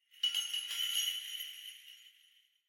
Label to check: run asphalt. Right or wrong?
Wrong